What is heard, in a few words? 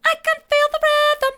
i can feel the rhythm mid, vocal